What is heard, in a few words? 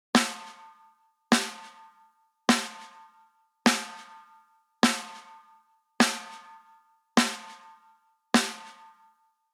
smack Attack Sustain On